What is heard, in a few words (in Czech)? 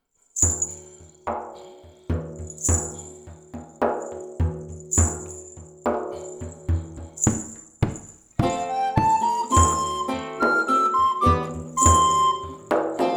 flétna + loutna + bicí; T. Susato : Allemaingne; Flautoškola 2 – METODICKÉ NAHRÁVKY a DOPROVODY